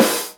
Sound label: drat, snares real